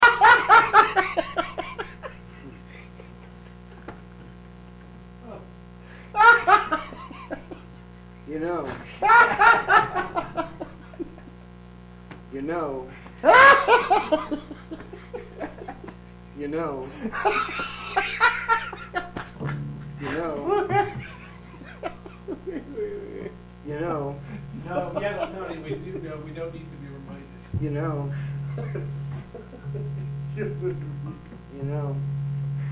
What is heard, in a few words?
laughing